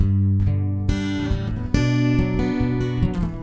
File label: Picking G, Bm 74